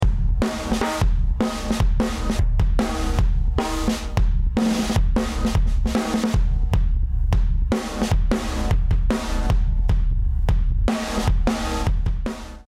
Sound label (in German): Drumbeat ohne Becken, schnelle Attack, langsame Release; T-Racks Black 76